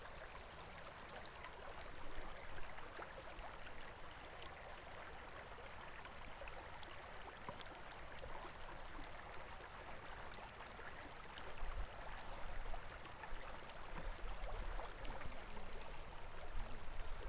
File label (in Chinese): 水